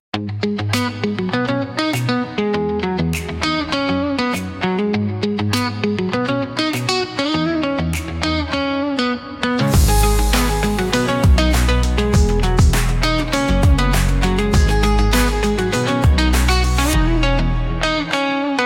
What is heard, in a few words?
Pop